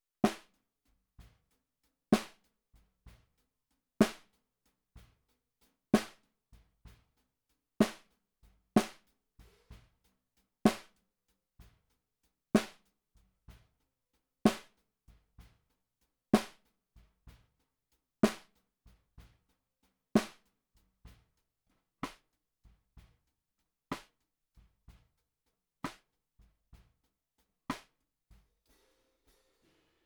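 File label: Snare Bottom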